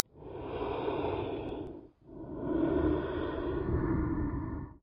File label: Breathe Left